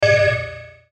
button high tech; Multimedia

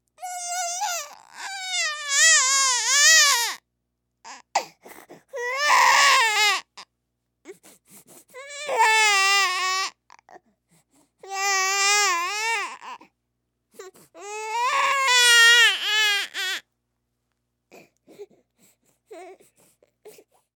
Human Baby Crying Long; Babies; Voice

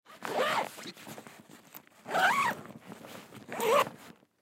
Hockey Bag Zip, Hockey Bag Zip Up

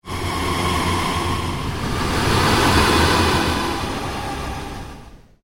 PULSE LONG SWEEP

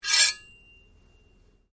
Blade Scrape Fast Ring, Sword

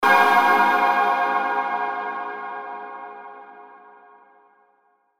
Hit Burst Reverb Shimmer Metallic Ring; Production Element Imaging Element Accent Transition

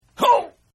Grunt; Grunts; Voice; Partners In Rhyme

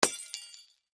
Impact Christmas Bulb Smash Ornament Glass

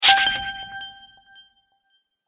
Coin Magic, magic sound effects

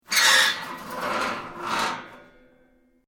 Metal plates scrape; Materials; Foundation

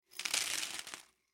Food Nuts Set Down On Paper Plate Short; Household

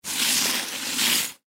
Plastic Bag Push Away Scrape Table; Wood Table; Foley; Blastwave

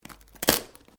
Branches Dead Snap

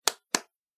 Two Hand Claps; Body Sound Effects; Human Body Sound Effect

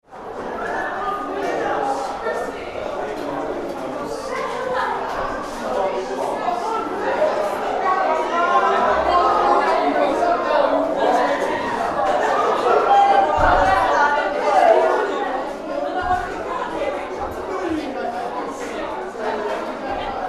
School Children At End of Lesson Medium Shot; recorded from end of corridor

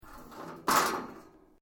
METAL PLATES MOVE; Metal (Sound Effects:Materials)

